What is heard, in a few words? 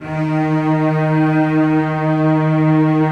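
celli sustain d#3; Sonatina Symphonic Orchestra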